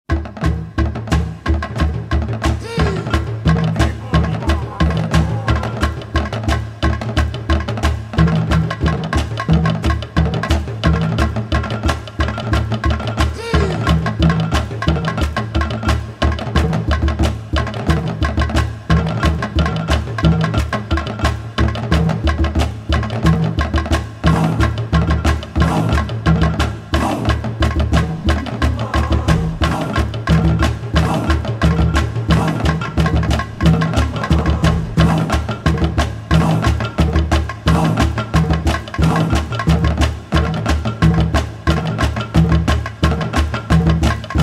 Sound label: Sacrificial DANCE, Music Dance Theatre